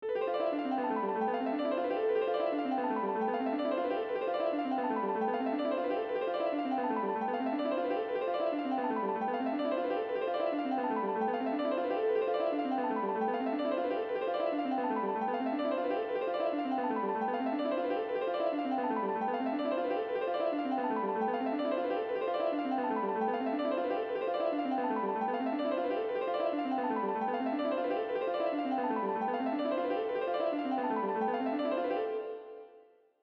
Dual Arpeggios In A

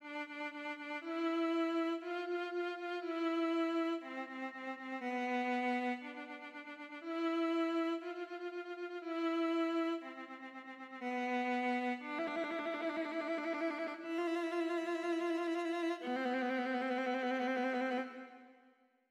Fingered Tremolo Viola; approximately 22 seconds; NOTION 4 Bundled Solo Viola: Three Flavors of Fingered Tremolo

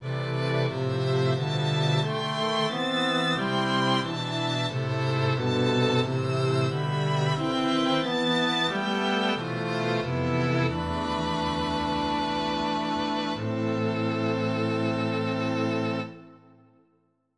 Melancholy Strings

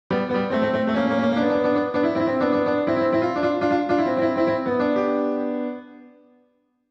Keyboard Test

